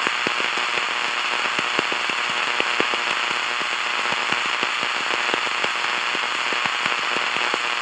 radio static loop; props; alex radio

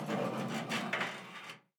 metal stress; survival

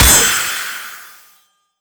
gastank fire start; combine soldier

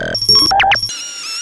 mine reprogram; Half Life 2; Episode 1; roller

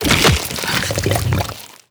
bite digest, barnacle creatures; Half Life Alyx

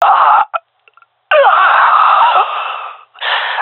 death, male; vo

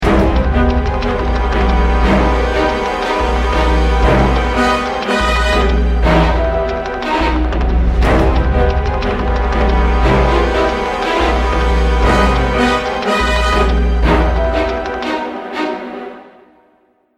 start round, music

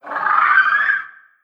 waiting call, headcrab reviver; creatures; vox

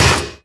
radio impact lg, metal; physics

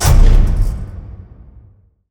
paint piston stop; Portal 2

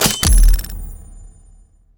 coin pickup; ui

